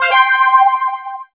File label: powerup; The Wild Thornberrys Movie